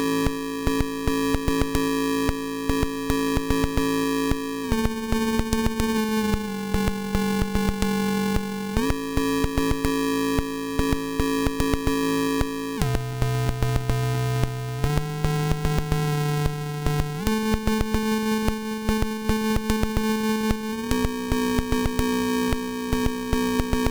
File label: Click Rhythm, pokey